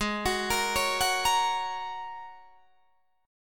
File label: Ab 9th Suspended 4th Ab down Guitar Drop D